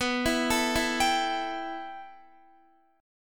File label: B 7th Suspended 4th Sharp 5th B down Guitar; B7sus4#5; arpeggiated; JGuitar